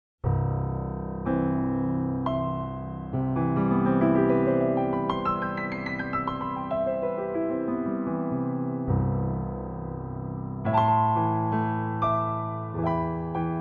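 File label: Mistral Warm, Pianoteq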